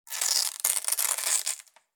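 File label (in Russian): липучка клейкая лента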